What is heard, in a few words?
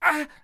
Ice Archer Death Vocals